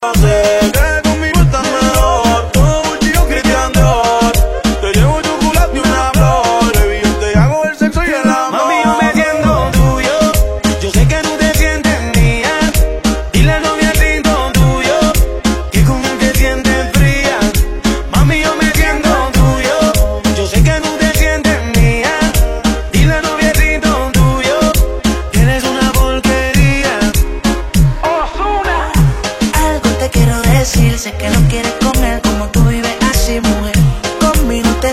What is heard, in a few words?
Reggaeton